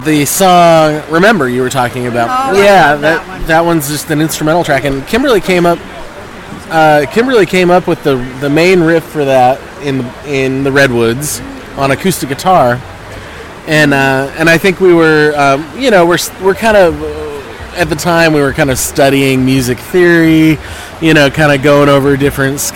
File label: Running Riff